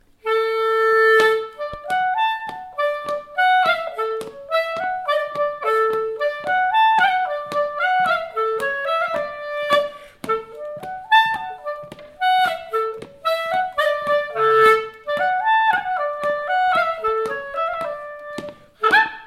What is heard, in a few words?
Polka; Kingo Pedersens